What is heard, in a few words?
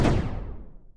laser cannon shot; RD501-Aux-Archive; RD501 Vehicle Weapons